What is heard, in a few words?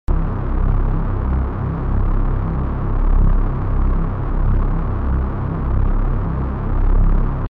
cinematic background